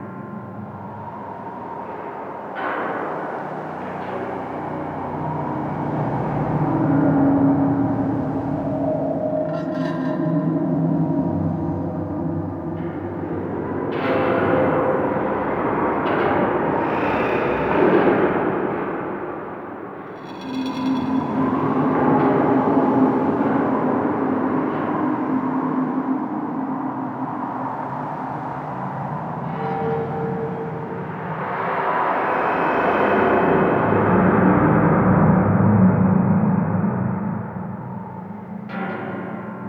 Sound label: Interior Amb Bed, Tunnels; Tau; Sentient Tunnels